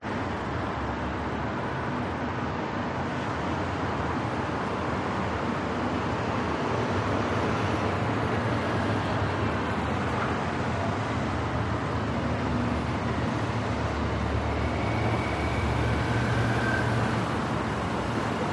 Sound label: BALCONY Morning Heavy Traffic Rush Hour Construction Irish Sirens